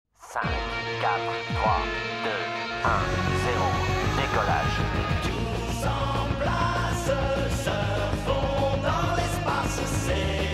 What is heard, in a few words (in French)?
musique generique